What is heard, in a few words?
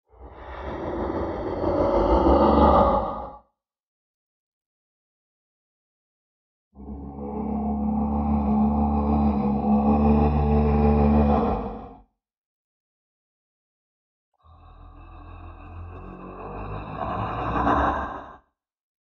Cartoon monster; هیولا کارتونی